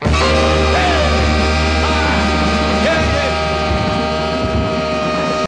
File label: downshift stabs and vocal; SAMPLED MUSIC